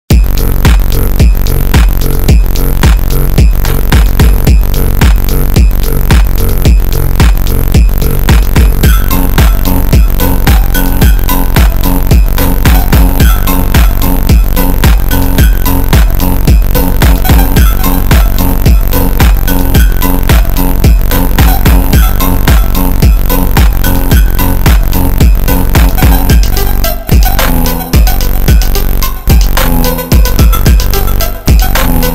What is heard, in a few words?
slowed